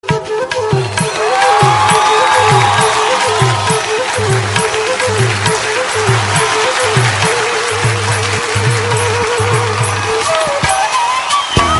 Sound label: Audition Flute